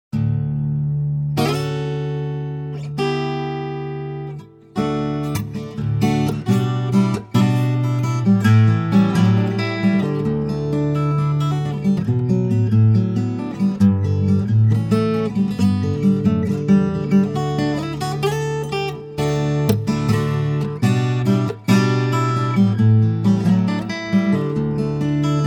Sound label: Guitar